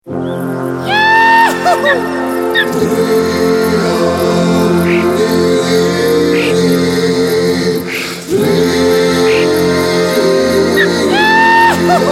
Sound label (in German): klingelton; allgaeuer bueble bier; handy